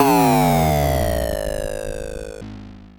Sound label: FX, Dual Percussion Synthesiser; Klone Dual-Percussion-Synthesiser